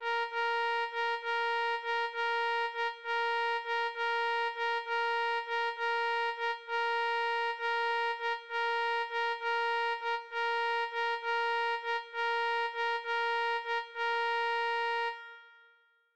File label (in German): Das hohe Wecken